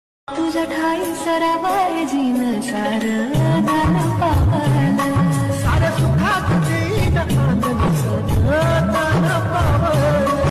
song ringtone bgm marathi; lagir zal ji

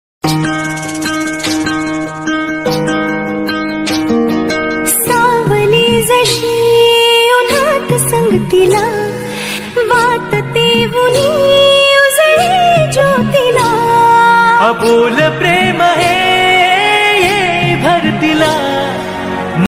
marathi iphone ringtone marathi bgm ringtone

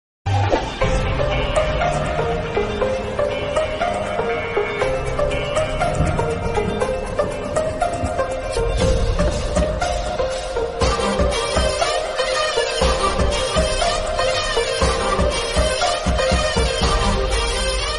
tamil ringtone; pudhupettai

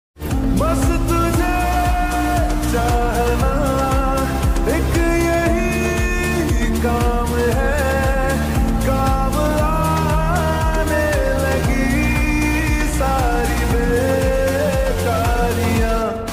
slow reverb

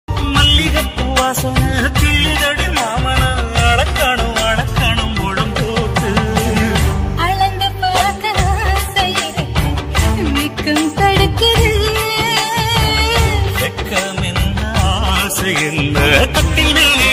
tamil classic whatsapp status; village

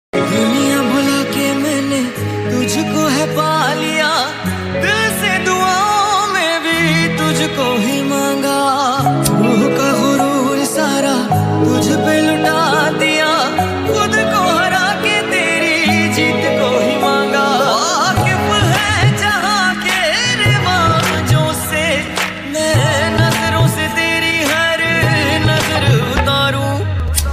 hindi movie ringtone

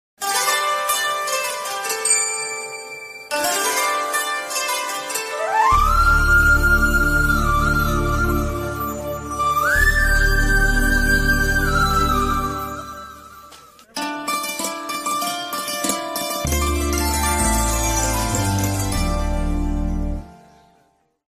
bgm tamil bgm tamil ringtone bgm; saamy

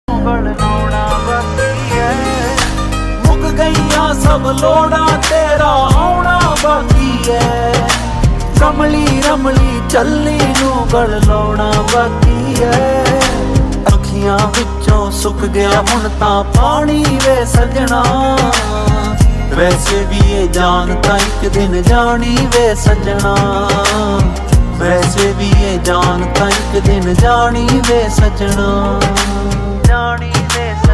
punjabi songs sad ringtone

